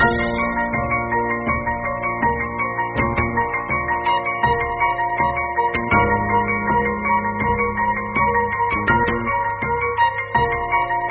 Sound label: piano strings, Sad; loops